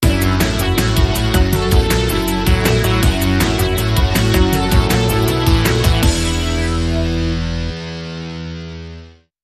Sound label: Sting